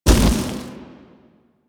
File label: Jetpack Thruster Burst; Warframe; DE; Vehicles; Veilbreaker